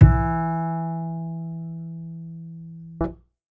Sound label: bass acoustic